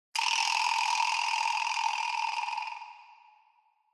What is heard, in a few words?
Vibraslap Low Short Reverb